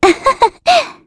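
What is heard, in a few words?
Vox Laugh, Korean; heroes; Estelle; kingsraid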